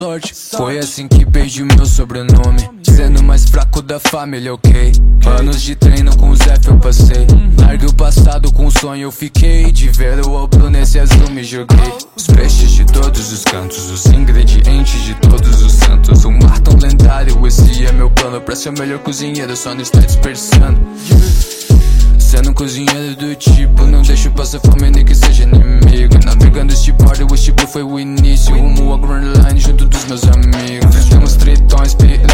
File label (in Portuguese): Rap; Sanji